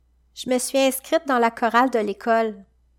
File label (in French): Chorale